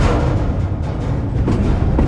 lift move; movers; doors; Soldier Of Fortune II Double Helix; XBOX

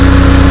honda eng; Chase Hollywood Stunt Driver; XBOX

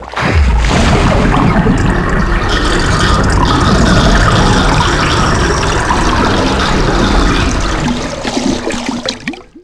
empty pool; water; Tony Hawk's Project 8; XBOX

